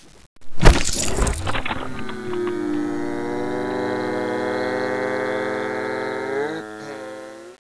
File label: flower strike